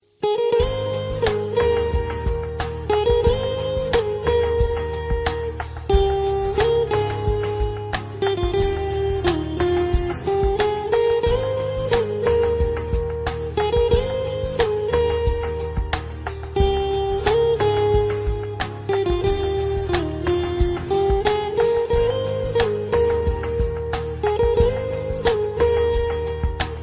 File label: guitar ringtone